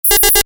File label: Computer Beep, Short; Multimedia; Stuart Duffield